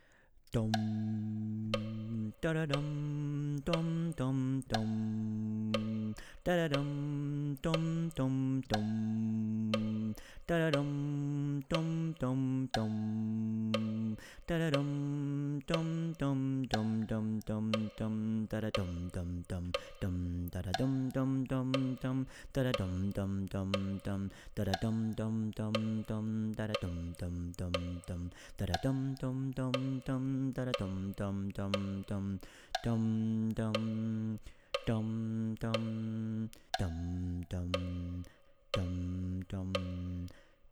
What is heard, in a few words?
Bass